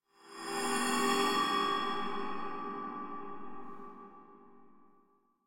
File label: Metallic Stinger By Scary; Hollywood Edge; sound designer's toolkit v2